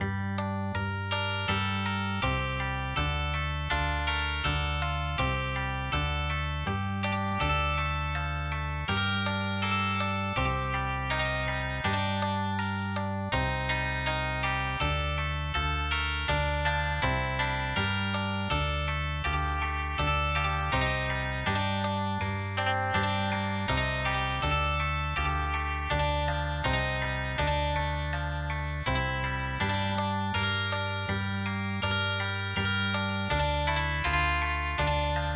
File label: spring rain okinawa